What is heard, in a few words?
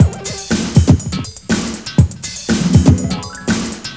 kick